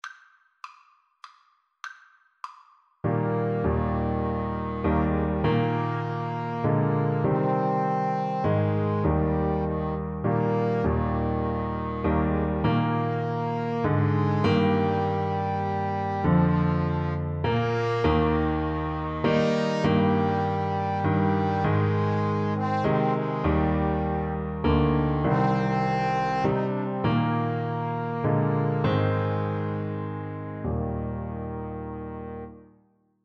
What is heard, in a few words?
Piano Trio